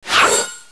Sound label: Knight Skill